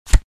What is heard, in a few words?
Dealing playing cards